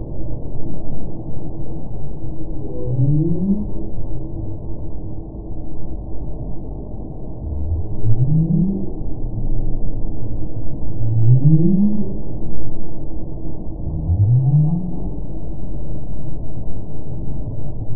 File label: right whale